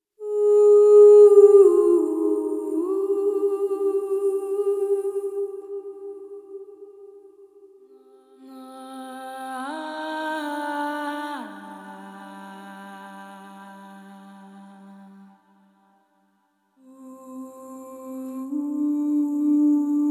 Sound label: vocalise phrases